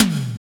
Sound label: TOMS; DNC TOMS; BAMBTOM